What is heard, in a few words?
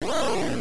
War Cry; Dr. Jekyll and Mr. Hyde; NES